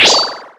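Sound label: Battle Skill; Etrian Odyssey III HD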